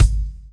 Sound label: baroque kick; Kicks; single hits